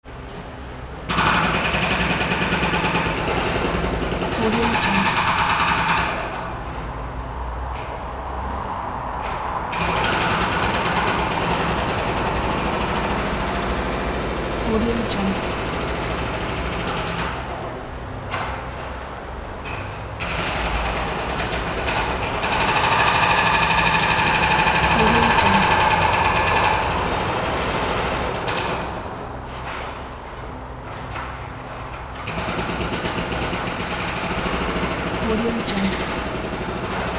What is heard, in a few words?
jackhammer ambience city